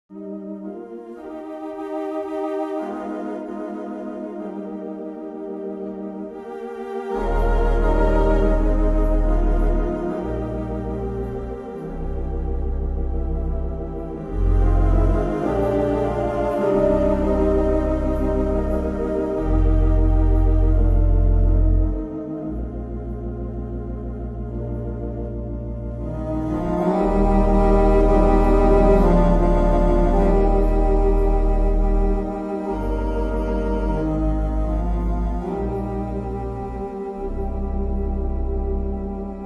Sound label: organist